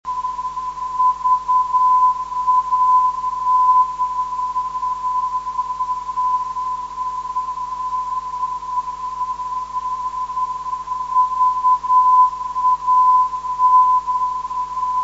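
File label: 367 kHz, non-directional beacon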